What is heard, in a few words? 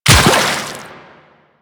Run Water, footsteps